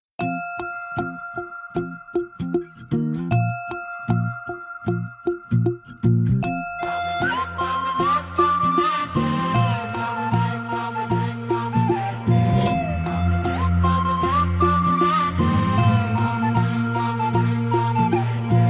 Starting Tone

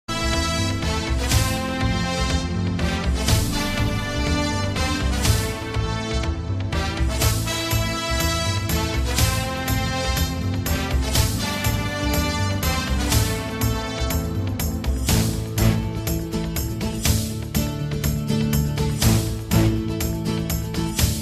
Theme Music

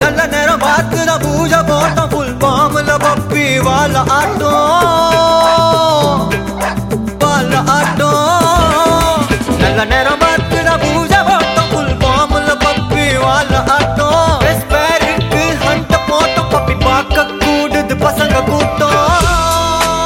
Song Tamil Love Ringtone

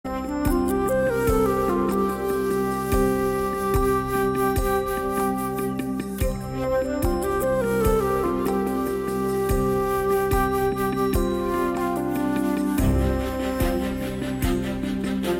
BGM

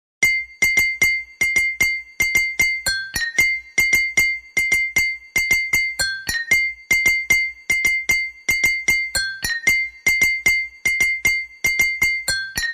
Glass Sound Ringtone; Glassmates

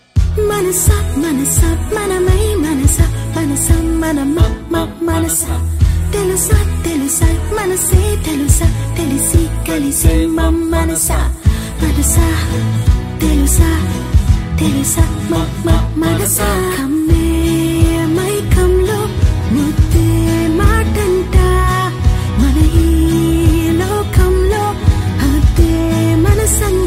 Female Version Ringtone

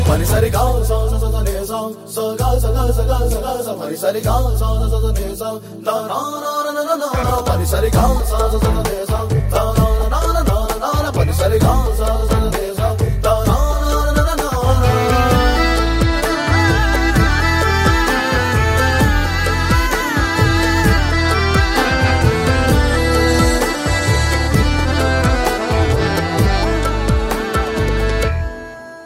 BG Tone

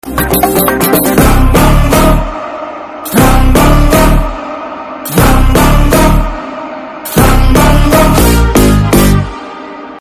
BGM; Disco Raja

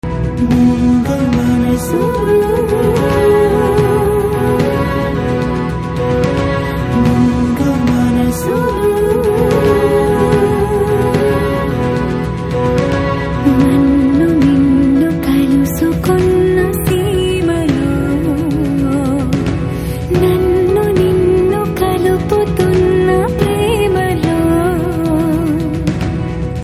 Ringtone

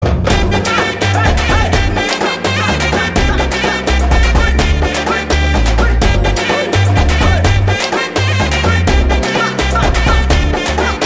Mass Bgm

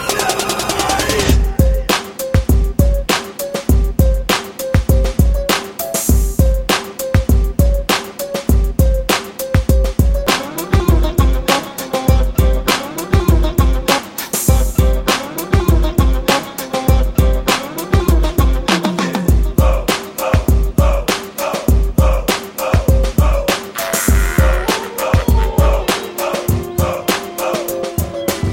BG Beats

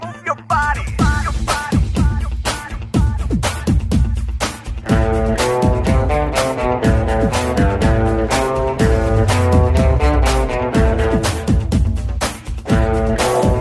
Ringtone

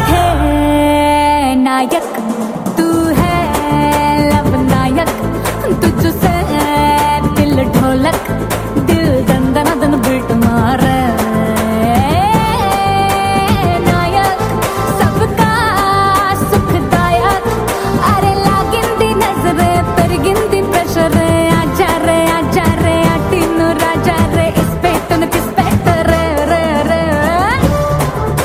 Drum Beats

